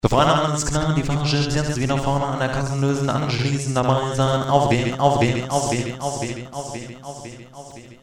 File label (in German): Jingels